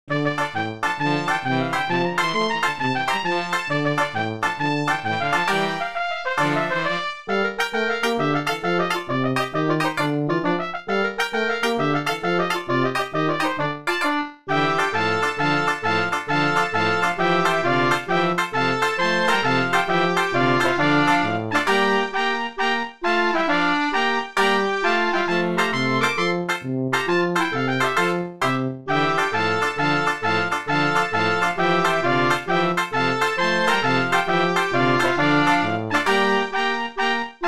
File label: fanfare; taderfia chapelloise